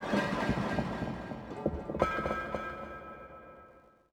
city occasional

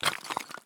walk tile; Escape from Tarkov

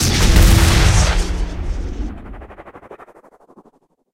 killscene pierce, tanks

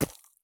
run gravel; Escape from Tarkov

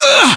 pain, voiceovers; english

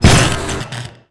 small explosion

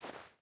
footstep barefoot snow walk; Rust

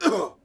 Hit Vox; Civ4 warlords

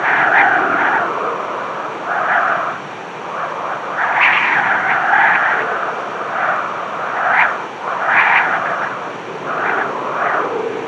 wind obstacle corner; Call of Duty Modern Warfare

